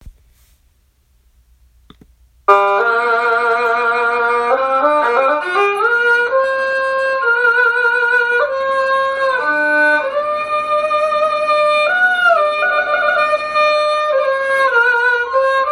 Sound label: zhongu strings